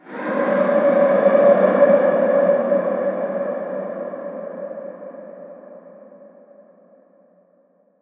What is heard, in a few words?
Atonal Depths, Psytrance